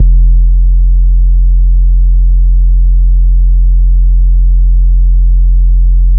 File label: Sub bass only